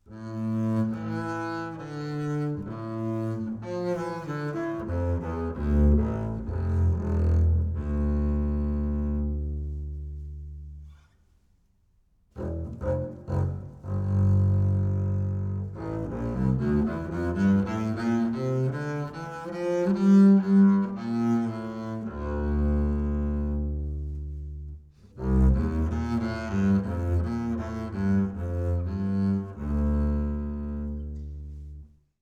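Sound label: acoustic bass Bowed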